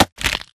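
Stalker Movement Synced Melee Face punch Catch Fist; Titanfall 2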